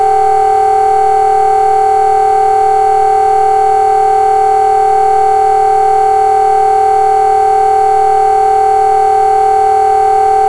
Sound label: 2330 rpm